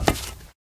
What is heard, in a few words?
black tile, footsteps